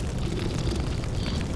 trap idle, monster

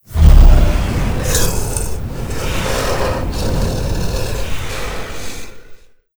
boss main, monster

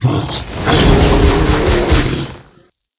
plant troops attack, monster

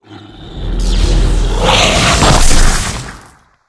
stone attack, monster

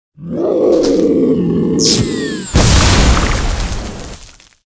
mech ape archer dead, monster

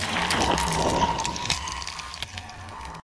beetle main, monster

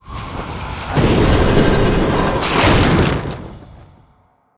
reaperboss, monster

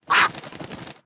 Humming Bird; monster

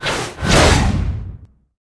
mummy blader attack, monster

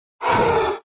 bugmander damage, monster

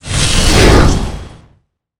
portal spwn

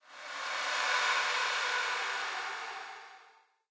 cemetery, snow

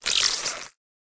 mantis damage, monster

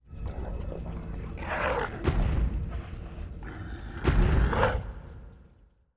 darkspirit idle, monster

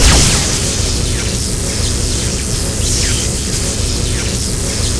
beetle raser

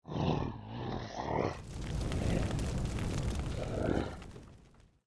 minotaurus idle, monster

